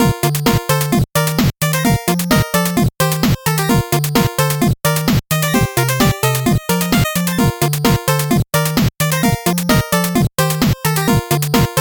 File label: 8bit bgm slow